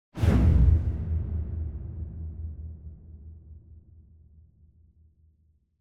Symphonic Hit Bass Strings Hit - Stronger And Deeper; Hollywood Edge; sound designer's toolkit v2